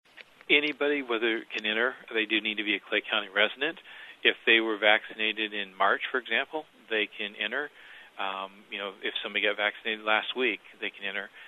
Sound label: SWEEP